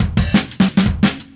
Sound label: 175, Breaks; Drum&Bass; EZ Rollers